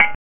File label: Falling Item; Dungeon Master and Chaos Strikes Back; Amiga